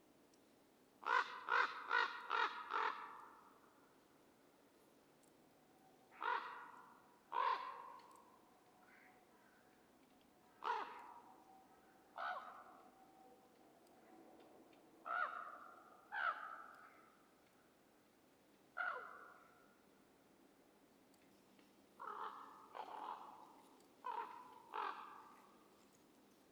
voron corvus corax

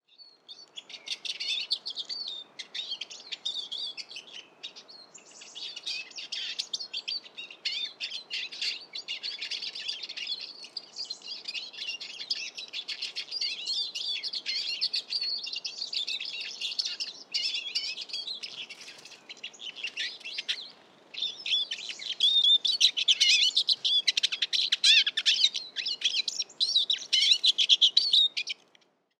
chizh spinus spinus; peniya ptits

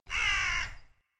zvuk krika odinokoy vorony; zvuki voron

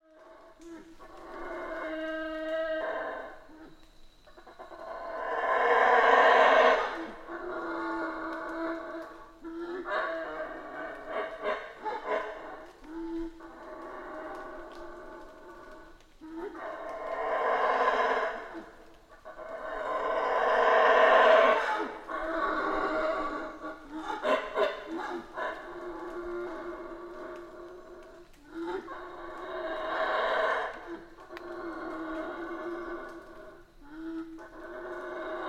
zvuki revuna obezyany

